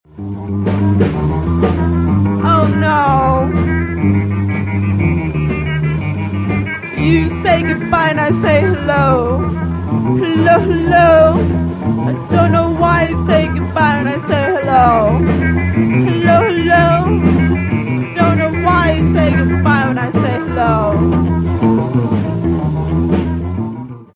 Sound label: ringtone